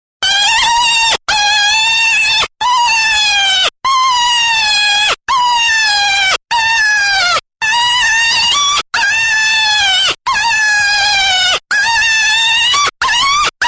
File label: Jackrabbit Distress